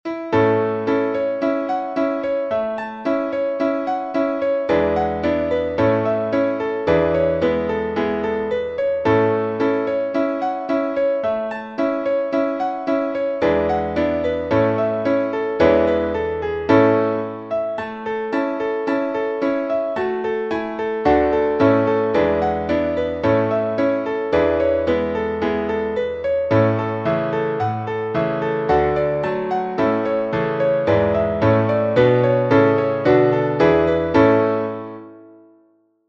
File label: Eightsome reel piano